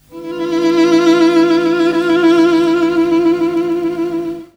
String - 9A, Jungle; Riffs, Arps & Hits; Blu Mar Ten; Jungle - 1989 to 1999

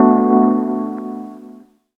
Rhodes, 10A; Jungle; Riffs, Arps & Hits; Blu Mar Ten; Jungle - 1989 to 1999

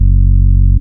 Bouncer Bass - 2B; Jungle; Blu Mar Ten; Jungle - 1989 to 1999

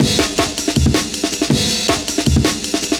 Wheel Up Amen, Breaks, Jungle; Blu Mar Ten - Jungle Jungle - 1989 to 1999 Samplepack

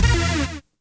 Mentasm; Jungle; FX; Blu Mar Ten - Jungle Jungle - 1989 to 1999 Samplepack

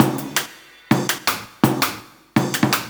Sonic Break; Jungle; Breaks; Blu Mar Ten - Jungle Jungle - 1989 to 1999 Samplepack

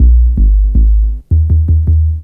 Bass - 1A, Jungle; Blu Mar Ten; Jungle - 1989 to 1999

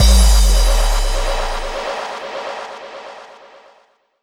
Aura Boom, FX; Jungle; Blu Mar Ten - Jungle Jungle - 1989 to 1999 Samplepack

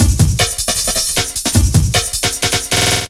Links Break, Jungle; Breaks; Blu Mar Ten - Jungle Jungle - 1989 to 1999 Samplepack

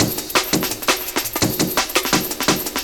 Tear Break, Breaks, Jungle; Blu Mar Ten - Jungle Jungle - 1989 to 1999 Samplepack

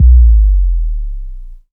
Sub - 1A, Jungle; Bass; Blu Mar Ten; Jungle - 1989 to 1999

